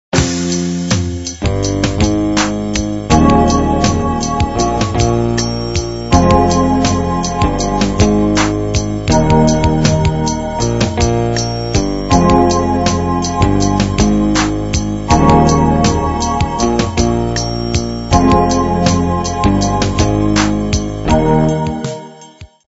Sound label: rock; WWE The Rock